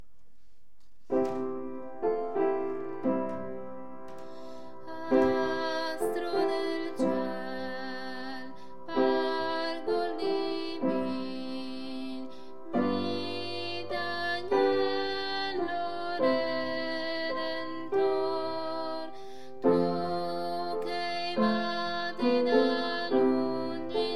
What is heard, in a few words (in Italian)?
astro tradizionale contralti